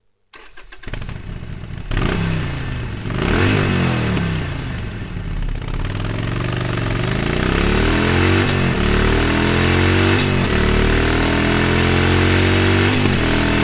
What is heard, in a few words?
Akrapovic Slip On, motos exhaust; Sportsters